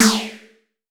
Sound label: SWEEP